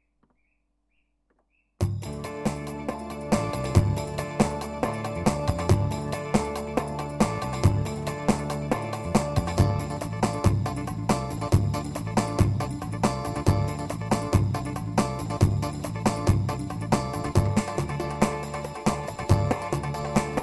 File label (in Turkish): pa-500 tr roman ritim